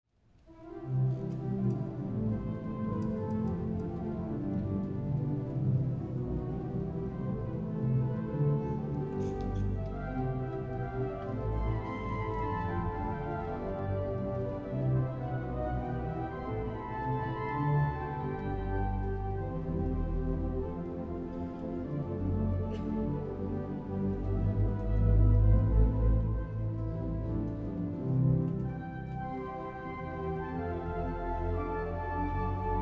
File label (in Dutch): Slotimprovisatie